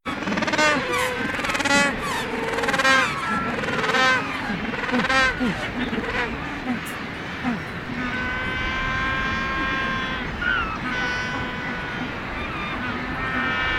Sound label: magellanic penguins colony; soundscapes